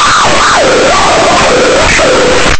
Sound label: kick